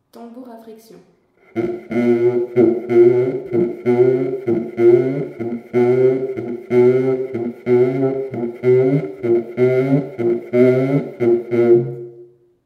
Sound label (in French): Tambour a friction